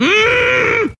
mmmmmm

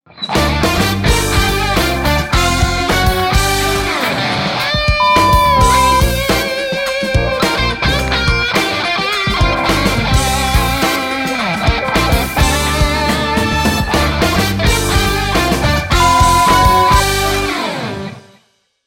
gameshow music

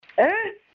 eeeeh

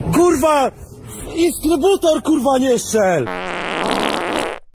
fart

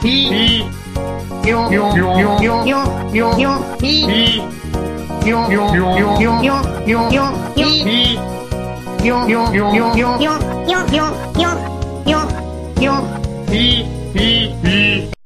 old man laughing young man way

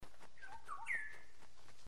golden bird

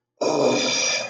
demon burp

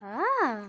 ah

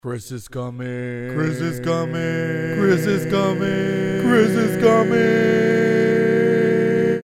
barbershop quartet; chris is coming

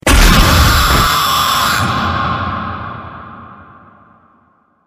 jumpscare sound effect; fnaf 6

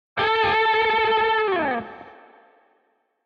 sample alerts; dlive

